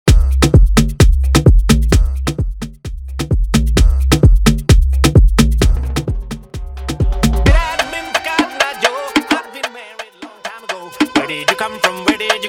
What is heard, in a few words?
afro house 130 bpm